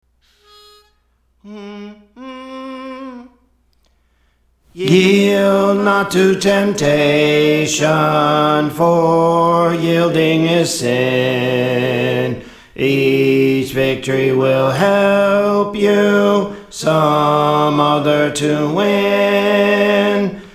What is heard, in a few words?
Bass; Praise For The Lord Training